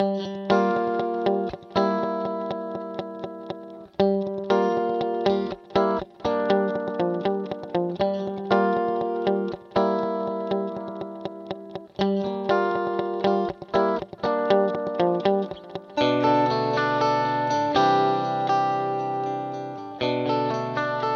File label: Guitar Yamaha D5000 Long FB Double Vintage Digital